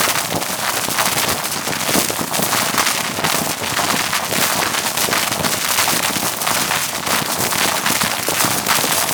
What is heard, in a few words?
Ice Weapon Beam Impact Loop; Warframe; Corpus; Corpus Railjack; Spec Ops Ice Moa